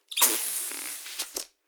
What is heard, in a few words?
deflating balloon